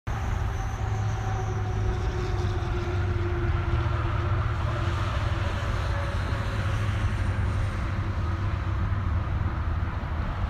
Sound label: highway noise; speed limit